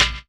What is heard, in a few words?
SNARE; E-Lab; X Static Goldmine 2; akai